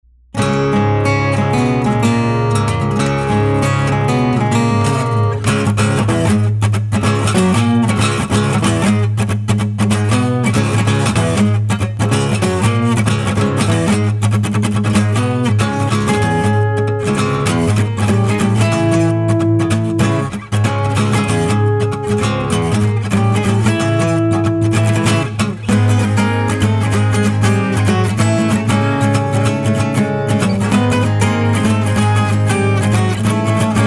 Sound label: Acoustic; Stalker